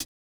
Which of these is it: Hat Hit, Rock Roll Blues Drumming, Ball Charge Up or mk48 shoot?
Hat Hit